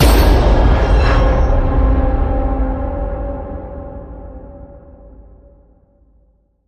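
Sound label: hit horror; Dustoff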